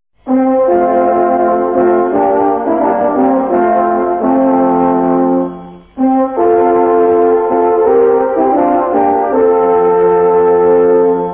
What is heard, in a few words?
interval signal; 维尔纽斯广播电台英语广播开台; radio vilnius c1982